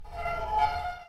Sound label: Panel Waterwheel Turn; Among Us